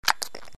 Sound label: Suck; Lewd SFX